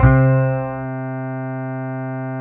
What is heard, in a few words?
Harps C4, Fairlight CMI; Keyboards2